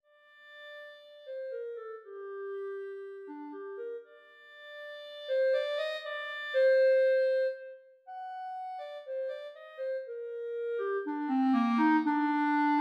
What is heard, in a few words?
soprano clarinets